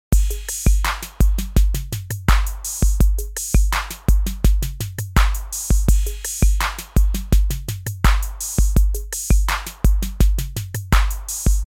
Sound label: 808 clone